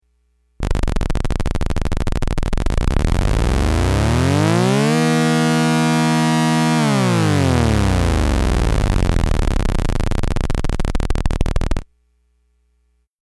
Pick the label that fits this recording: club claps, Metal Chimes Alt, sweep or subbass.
sweep